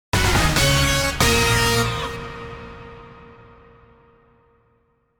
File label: Traffic logo only; Walkup; WLS